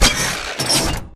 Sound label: wallrun start; Titanfall 2